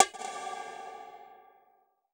percussion special

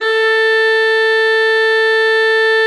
A4, Cathedral Organ, HOLZPRINZ.; Propeller Island

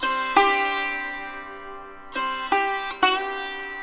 SITAR, Indian Traditions

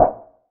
perc organic crick

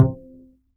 Upright Acoustic Bass, D STRING

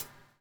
BUBL; Spectrasonics - Backbeat

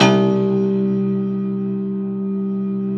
Rubbery Piano, prepared piano; Total Piano; Rubbery Piano 2 +ped

